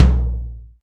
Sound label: DM5 Tom Hi, Alesis DM5 drum machine